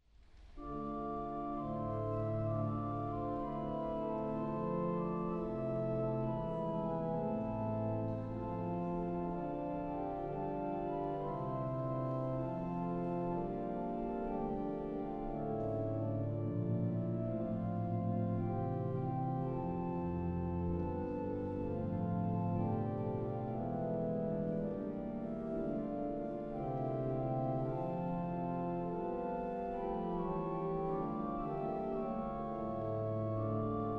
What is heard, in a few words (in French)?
noel bourguignon; FLEURY